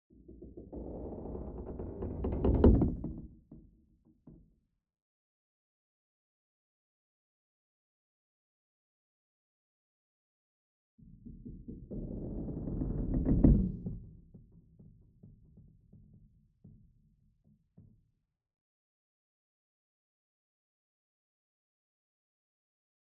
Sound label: wood creak doppler; Chupapsound; DOPPLER FX